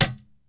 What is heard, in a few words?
flashlight